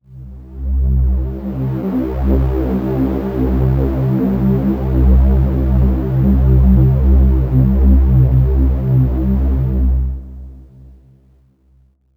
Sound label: pad C2